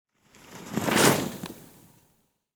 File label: skiing passing quickly